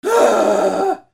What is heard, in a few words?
PEOPLE